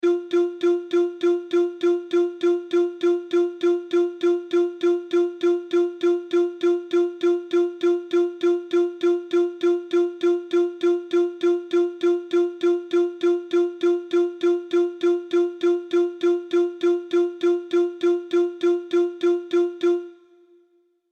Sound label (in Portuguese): amostra de pulsos com periodicidade